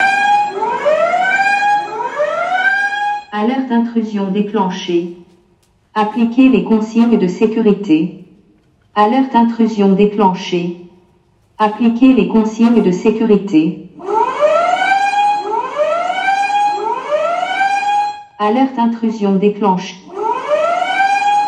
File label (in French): Alerte intrusion